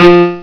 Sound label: piano